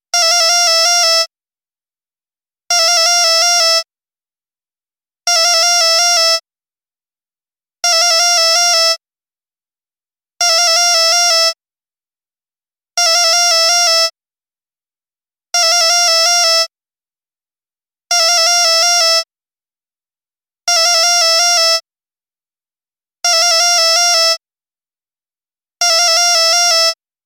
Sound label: Low-tech phone